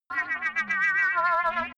crazy science objects, energie

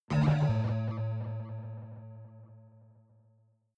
protective shield hit absorption buzz; energie

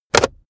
car backseat table pull in cupholder fast hard

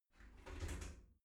shower slide door slide close

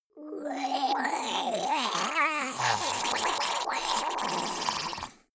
monster die gurgling high; kreaturen

